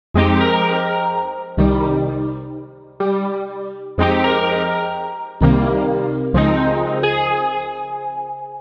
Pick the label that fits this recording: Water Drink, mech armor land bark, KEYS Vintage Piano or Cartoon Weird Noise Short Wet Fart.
KEYS Vintage Piano